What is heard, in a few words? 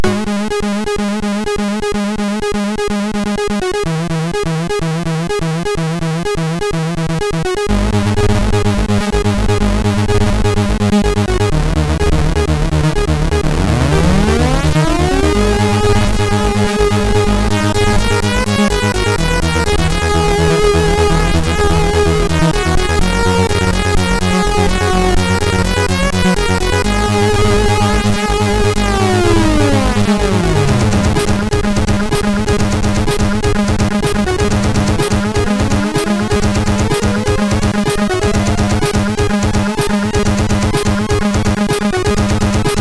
Spec 48k Ping Pong Remix, sid MOS6581R3